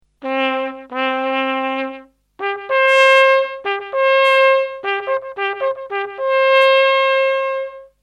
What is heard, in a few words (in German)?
wasser marsch rohr 2, Hornist